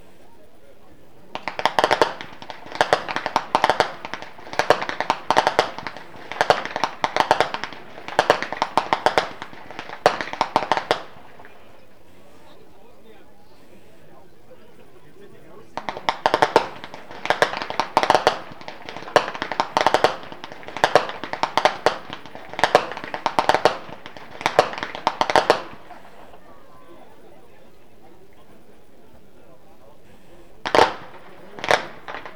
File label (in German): schnalzen, flachgau; maxglan